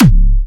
Springer C#4, Big Room Kicks

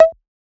Percussion; FLPRO

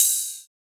Ride; FLPRO

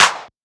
Clap; FLPRO

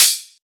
Open Hat; FLPRO

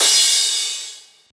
Crash

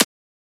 Transient; FLPRO

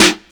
Snare

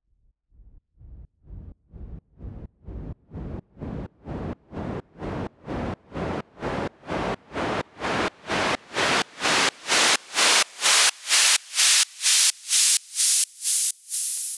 Raise SideChained

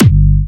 Kicks, Big Room Kicks, G4

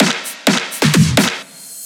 EDM Drum Fill; FL PRO

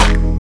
Snare

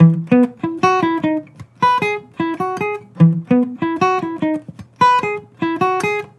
Acoustic Melody Loop 150 BPM E Maj; Cymatics

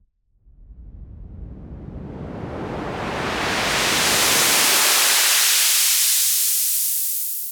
Raise Clean

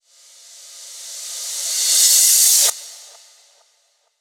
ReCrash; FLPRO

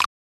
Snap

